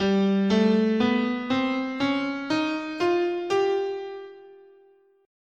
G Major Locrian, scale

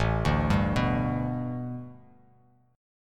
A 7th Suspended 2nd Suspended 4th; A7sus2sus4 arpeggiated

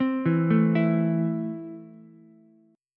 E Suspended 4th Sharp 5th E down Ukulele; Esus4#5 arpeggiated

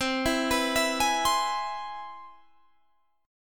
C Augmented Major 7th, Guitar, Open G

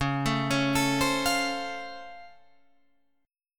Db Augmented Major 7th, down, Guitar, Drop D

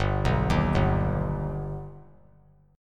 G Augmented G, chord; G+ arpeggiated; JGuitar

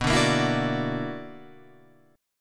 B-Minor Major 11th, Guitar Open F; BmM11; strummed; 11,9,10,11,9,9; JGuitar

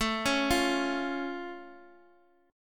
A Augmented A down Guitar Standard; arpeggiated; JGuitar

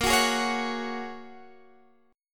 A sharp Minor 7th Sharp 5th, down

